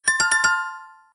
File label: recruiting; CosmicRage; comms